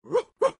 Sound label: dog med